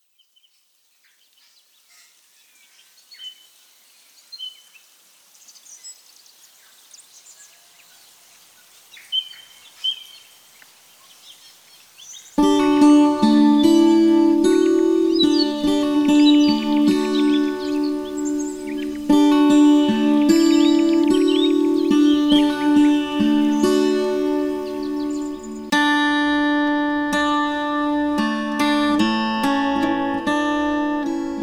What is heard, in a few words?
ambiance drums; smorgasbord